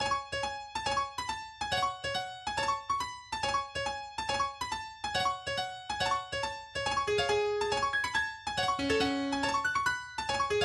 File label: No2 in C Sharp Minor